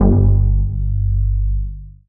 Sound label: Bass, One Shots; Touch Loops; BlissedOutBeats